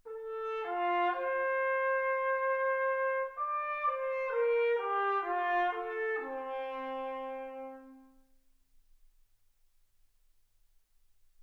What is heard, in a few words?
trumpet